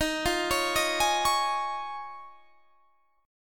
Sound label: D sharp 7th Suspended 2nd Suspended 4th D sharp; D#7sus2sus4; arpeggiated; 23,20,23,20,21,21; chord shape